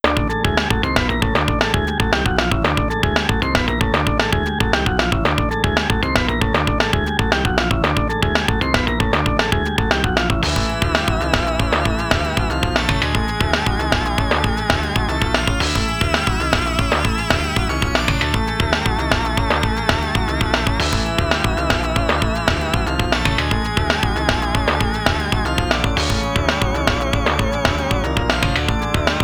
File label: FM Synth